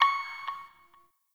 Hack Minigame Touch Node; Warframe; Duviri

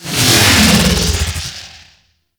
Sentient Vessel Movement; Warframe; Tau

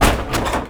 Metal Ladder Hit

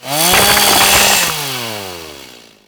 Ghoul Sawman Saw Ride; Warframe; Grineer Ghouls

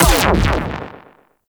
Biped Railgun Fire; Warframe; Corpus; CorpusRailjack

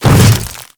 Step Ice, Footsteps; Enemies; Warframe; Grineer Hammer Boss

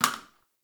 Janitor Plastic Spray Bottle; Warframe; Ambience; Nineteen Ninety Nine

